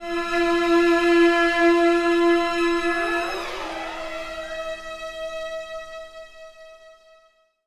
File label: STRINGACCENT, Orchestral Loops; Best Service ProSamples vol.33; AKAI